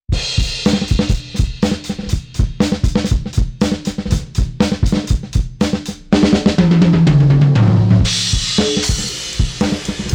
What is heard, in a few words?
Dirty Tape DIRT CLEAN